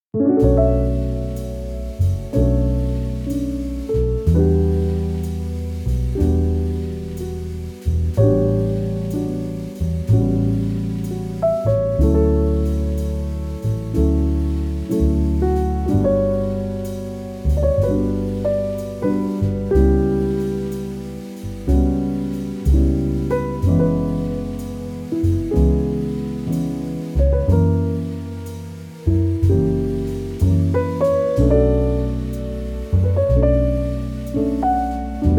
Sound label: relax romantic jazz calm soothing; home